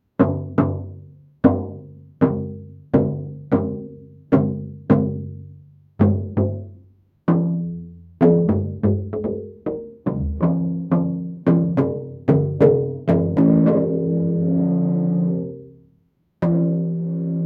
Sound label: a low pitch tuba sound, comedy